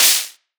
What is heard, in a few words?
noise layer